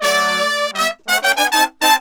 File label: 100, BRASS SAMBA